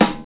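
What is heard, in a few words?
snare drum; E-Lab; X Static Goldmine 1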